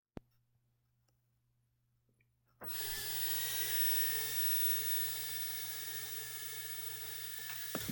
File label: Drone